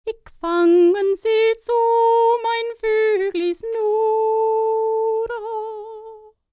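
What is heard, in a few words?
Scandinavian, World Voices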